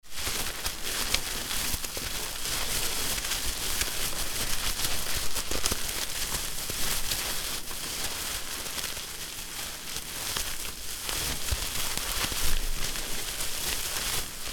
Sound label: Fishing net handling rustle